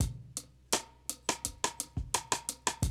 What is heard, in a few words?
Classic DrumLoops